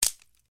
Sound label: Branch Breaking Forest Twig Snap Crunch